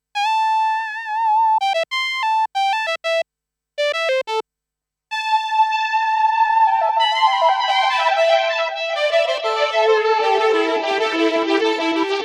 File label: synthesizer; eventide h90